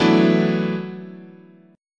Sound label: E 7th Suspended 2nd Suspended 4th, guitar chord